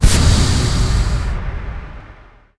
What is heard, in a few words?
concussive shot target; weapons; dota; skywrath